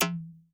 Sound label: Perc Reso, Vermona Mono15 Drums; Stereo layers